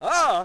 pre fall, Rider

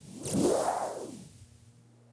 force heal

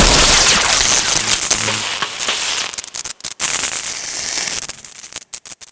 impact; Spider Man 1; XBOX; ENGLISH

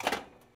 reel stop, slots

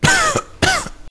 cough; Max Payne; hotel

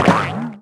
Ptwang hit; Finding Nemo; XBOX

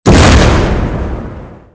boom, frag grenade; weapons; Soldier Of Fortune II Double Helix; XBOX

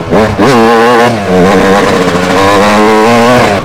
throttle, YAMAHA

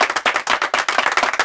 clap; WhiteOut; XBOX; nevsky

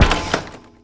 glass door open; Tony Hawk's Project 8; XBOX

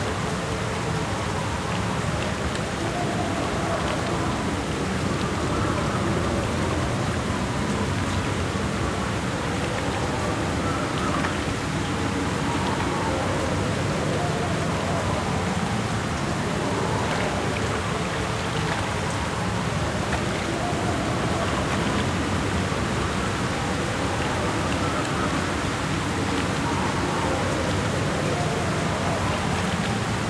water; XBOX; Grand Theft Auto Vice City

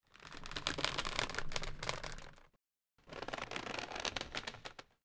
bridge, NATURE; Pirates Of The Caribbean

